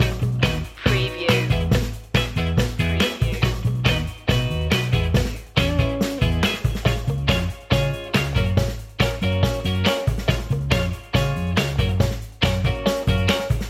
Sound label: old school detroit loop; build up